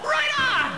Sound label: pain; turtle